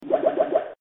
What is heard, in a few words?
swirl